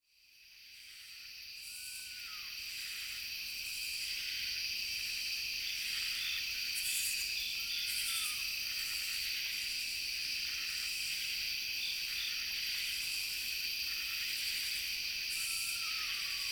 Chorus Insect Lullabies; Osceola

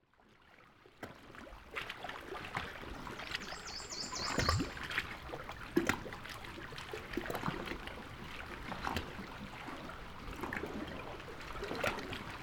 Cypress Water Dance Inland Waters

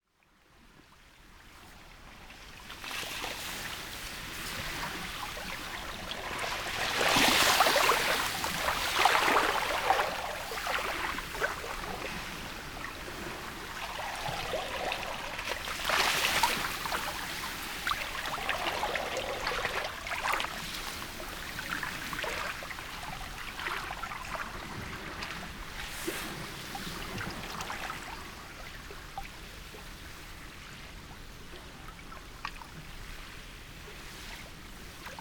Ice Jam Inland Waters

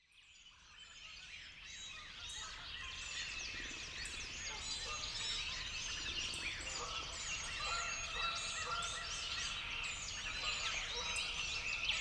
Birds at Dawn; Land Between the Lakes

